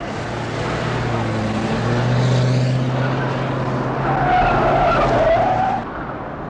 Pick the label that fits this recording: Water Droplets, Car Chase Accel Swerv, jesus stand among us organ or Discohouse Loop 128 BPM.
Car Chase Accel Swerv